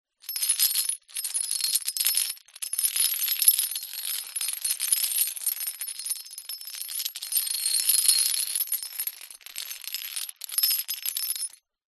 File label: metal small chain drag concrete ground; foley